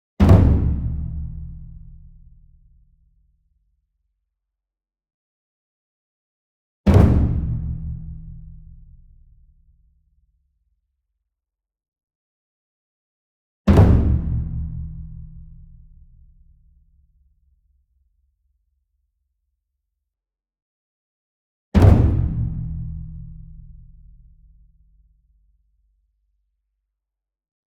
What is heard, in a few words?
ENSEMBLE DRUM Taiko Flam Medium; boom library; Cinematic Strikes Construction Kit